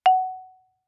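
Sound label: Marimba yarn fortissimo Gb2; MIS Pitches - 2014; Percussion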